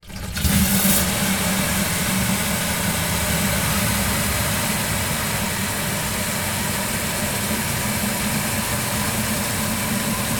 household water from hose into empty plastic watering can; zapsplat